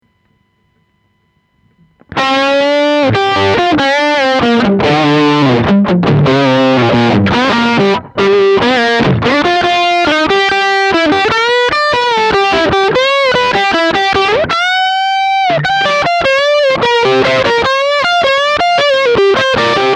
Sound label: Lead